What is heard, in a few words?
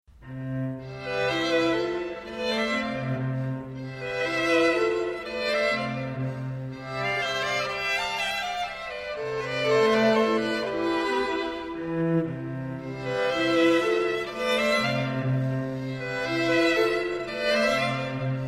strings flower duet; Delibes Flower Duet